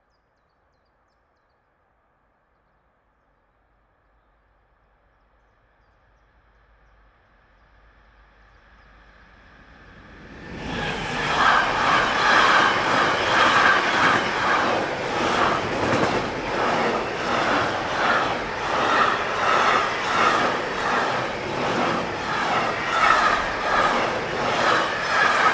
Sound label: freight train fast whooshes; soundsnap